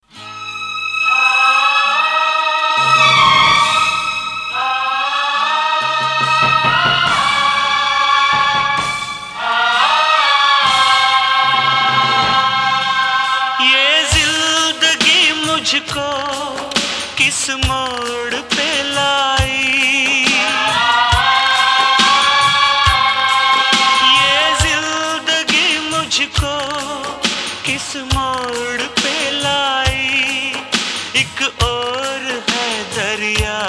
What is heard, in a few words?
Sad; Betaaj Badshah